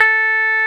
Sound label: Roxichord, E.Piano